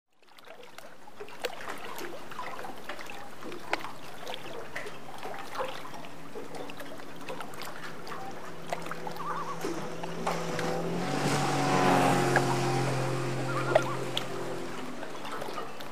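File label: harbour motor boats sounds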